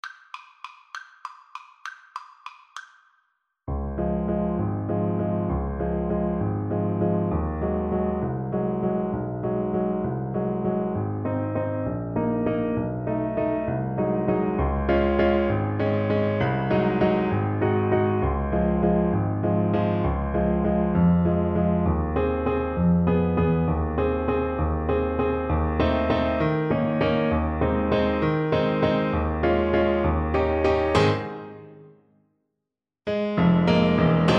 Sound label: waltz, Saxophone